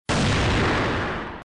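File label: AWM attack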